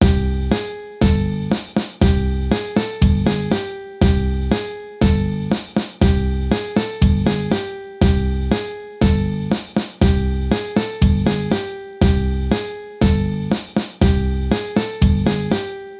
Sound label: Samba